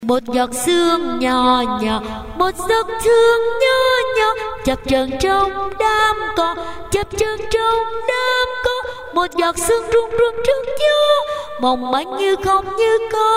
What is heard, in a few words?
tenor bass